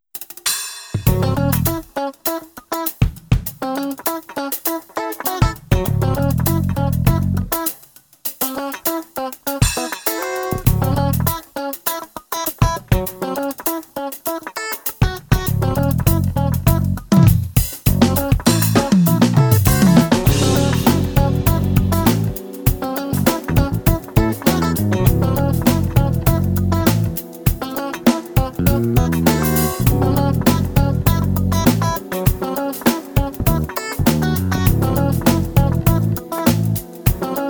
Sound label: Turkish funk trailer